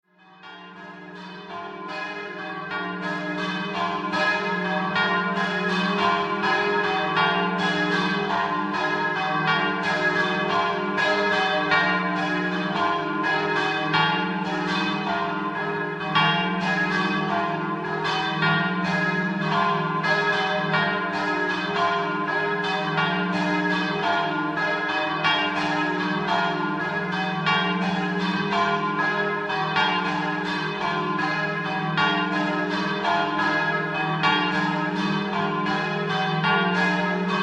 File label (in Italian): campane; CASTELMONTE